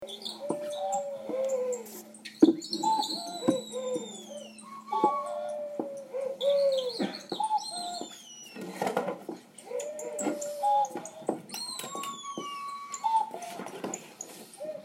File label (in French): Oiseaux; Cerny